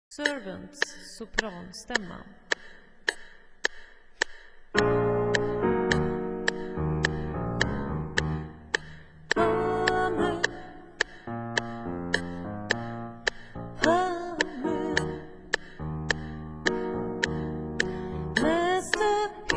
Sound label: sopran